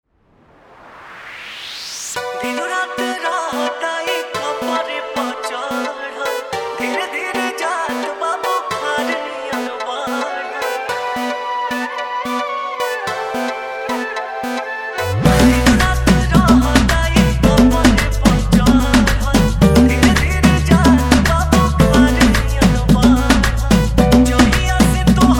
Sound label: Bhojpuri Dj Remix Songs Edm Trance Mix